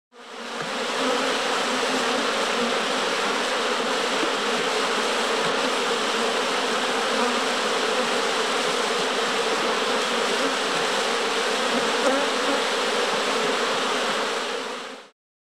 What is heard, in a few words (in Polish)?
pszczoła